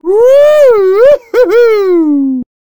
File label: Man Woohoo; Zomboid; Lifestyle